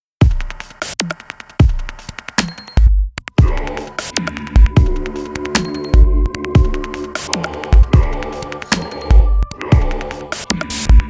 jingle spot; Musica Machina POP HD ROR

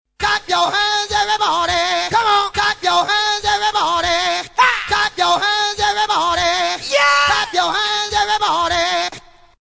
Clap Your Hand

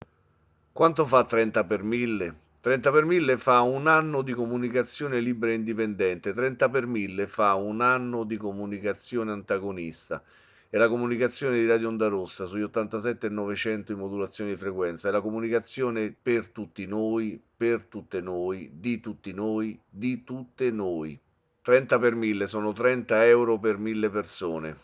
jingle

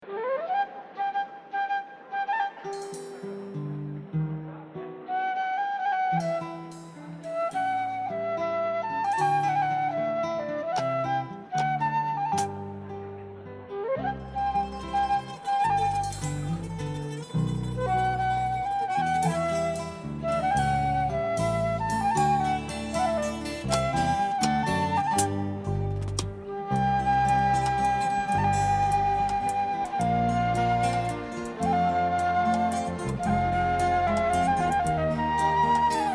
Instrumental

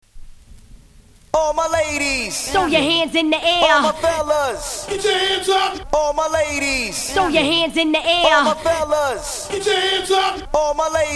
Handz Up Acapella

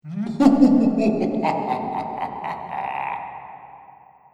EVILL LAUGH

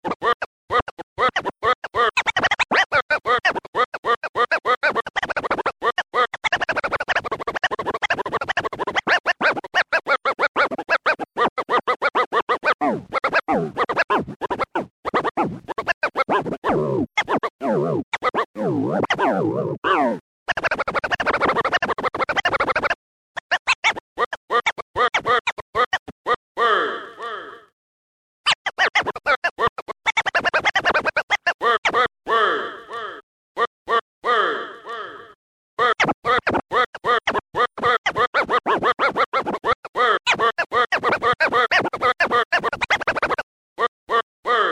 scratch

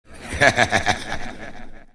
ahahah

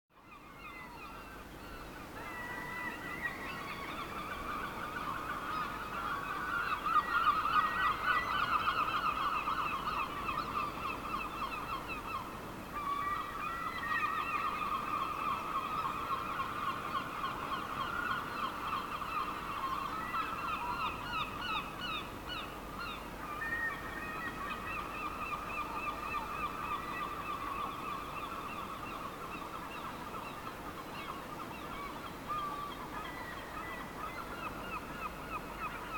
Seagulls

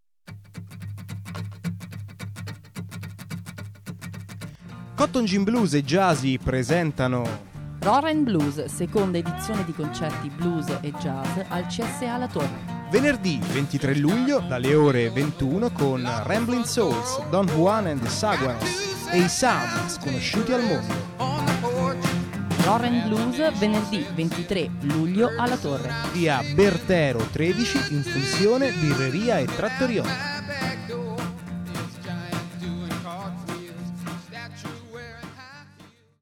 jingle blues

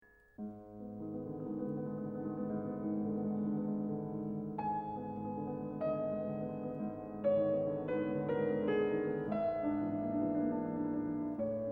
Symphonic Etudes, Piano; Schumann

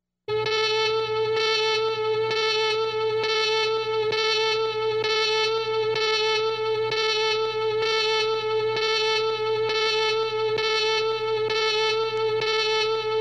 Red Alert; Star Trek

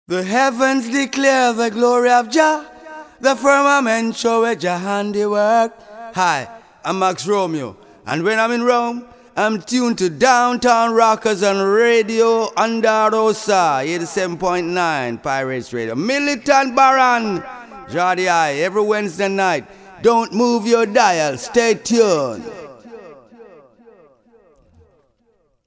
jingle

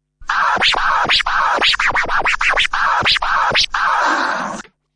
SCRATCHES

